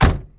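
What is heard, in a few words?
door close; Mafie 1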